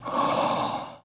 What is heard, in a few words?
Werewolf Breath, Airy Short